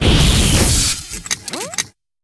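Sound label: Mech spawn, monster; steamer crazy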